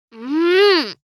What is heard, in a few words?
Angry moan teenage girl